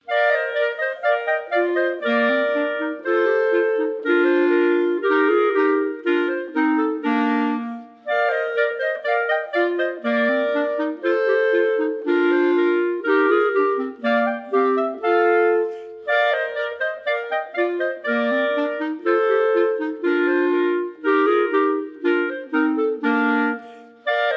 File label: March, Chamber Music for 3 Clarinets, complete version; Partita in F; Chamber Music for 3 Clarinets Vol I